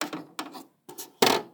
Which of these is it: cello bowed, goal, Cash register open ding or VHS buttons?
VHS buttons